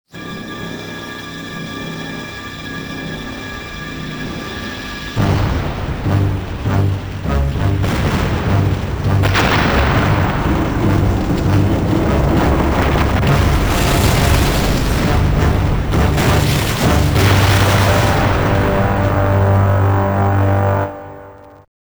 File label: Destroyed (Normal Ending); Metroid Prime Hunters; Oubliette Destroyed (Normal Ending)